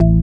BAS, Dance Bass; Sound & Vision - Gigapack II CD 1

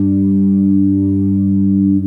B3 HAM, Keyboards Old School

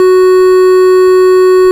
ORG, Farfisa Clarinet

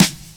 Dance Drums kit; Best Service ProSamples vol.15; AKAI

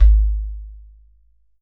GHATAM

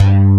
E FAT B. G2

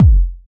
Kick, Kickdrum; Equipped Music; Loungin House

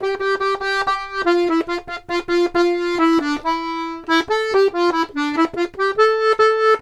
Accordions; USB Soundscan vol.40; AKAI

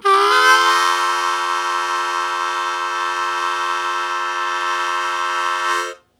Blues Chord Attack F1, Harmonica; 90 s Sample CDs; Zero G

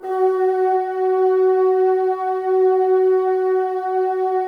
Bassoons F#4; Miroslav Vitous - Woodwind Brass Ensemble

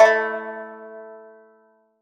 ethno, sound ethno; Ueberschall; Techno Trance Essentials

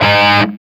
SOLDANO ROCK, Guitars

